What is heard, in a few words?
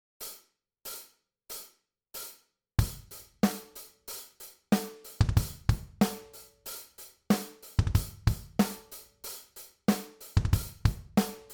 Drums